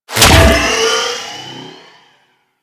Smite Projectile Hit; Warframe; Warframes; Paladin

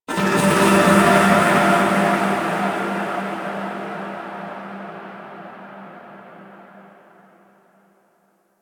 Random, Ambience Interior; Warframe; Orokin

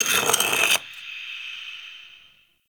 Stone Small Scratches; Ambience

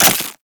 Melee Impact Glass; Warframe; Weapons

